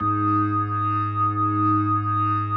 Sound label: G#2S, B3, Vintage Keyboards